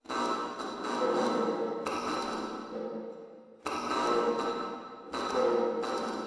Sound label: boss incubator